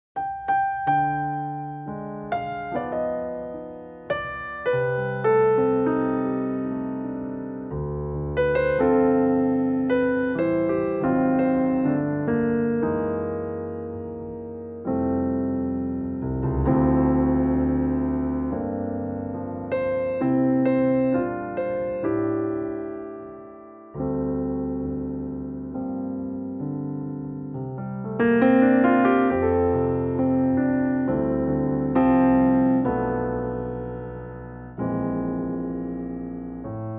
F piano

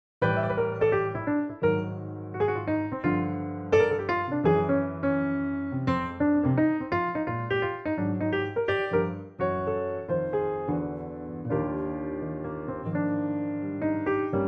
piano

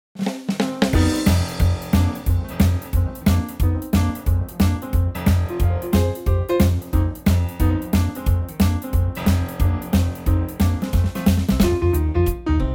Bb swing

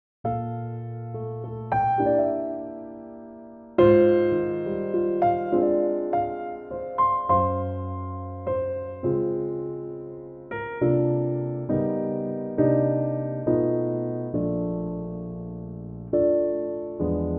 Bb piano

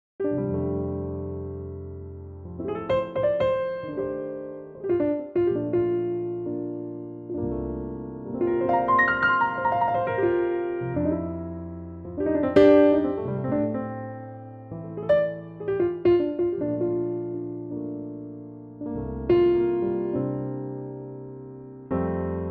F piano

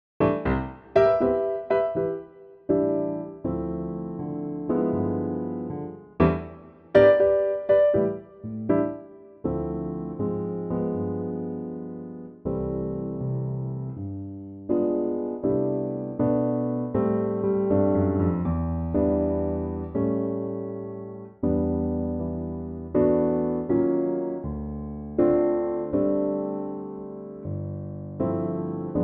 C piano

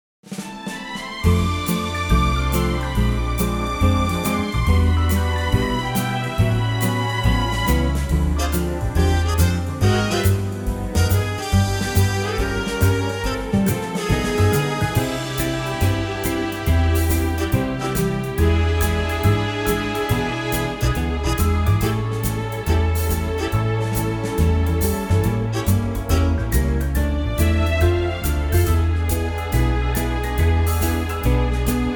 orch

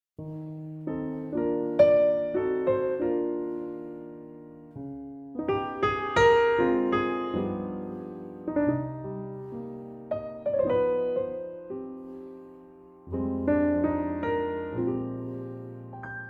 Eb piano

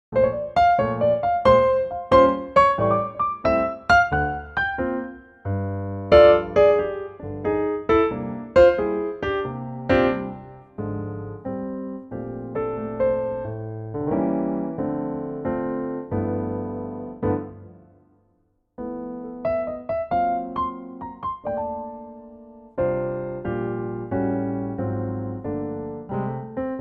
F piano

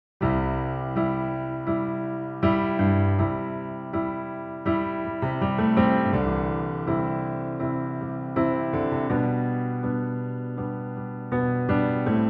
C piano

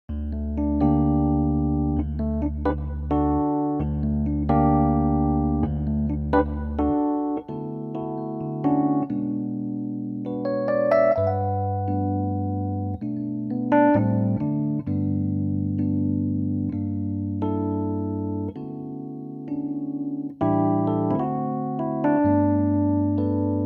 D piano trak

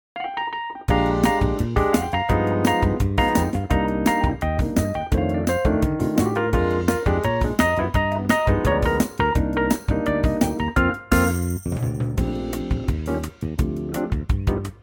Bb groove

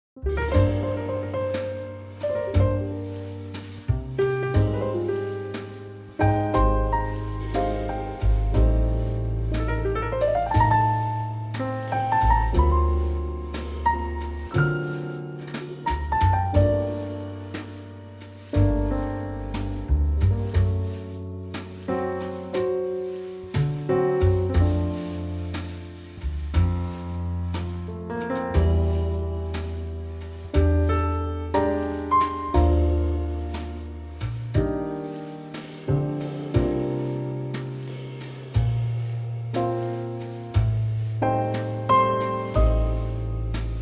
Gm trio

